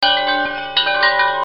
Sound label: success